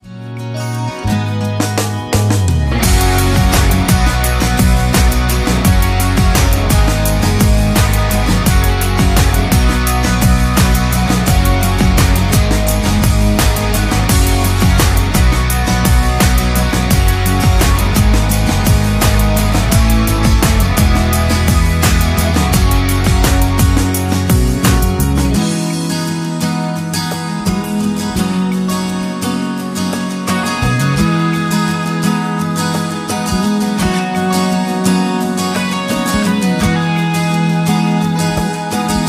Instrumental

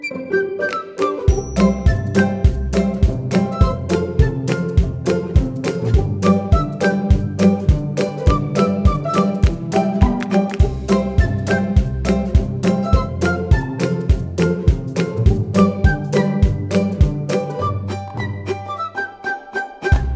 Instrumental

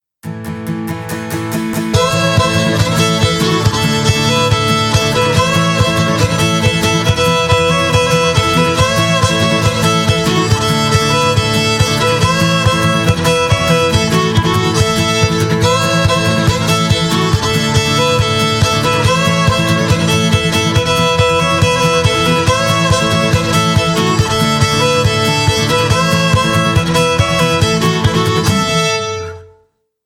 Instrumental

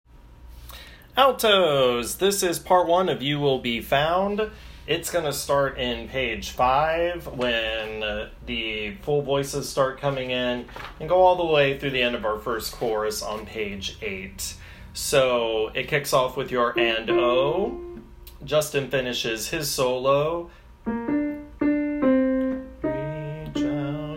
Alto

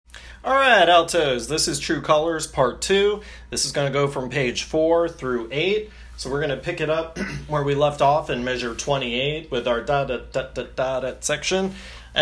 Alto

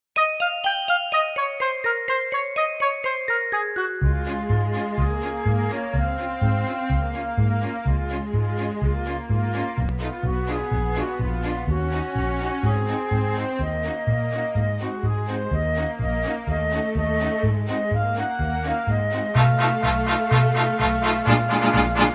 Instrumental; Christmas in Mistletoe Falls 3: The Chrismurdering

